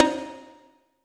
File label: Toms, Elektron MachineDrum SPS1 MKII